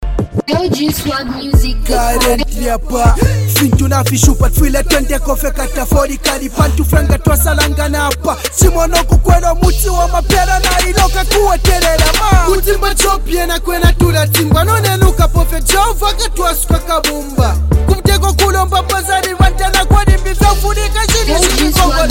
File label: Intro Freestyle